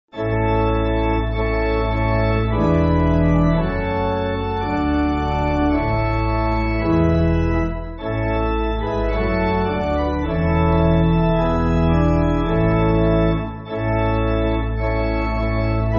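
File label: Pipe Organ, church music; The Laws God Has Given; Union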